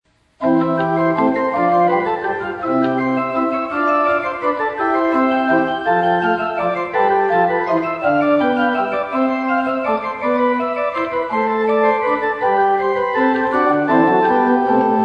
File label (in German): Allegro in C-Dur; Arne